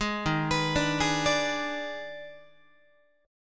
Eb Major 7th Suspended 4th Sharp 5th, Guitar, chord; arpeggiated; 11,11,14,12,12,13; EbM7sus4#5